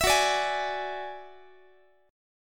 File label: F sharp 7th Suspended 2nd Sharp 5th, Guitar; x,x,19,16,18,19; 4A,4E,5A,5D,5Gsharp,6Csharp; strummed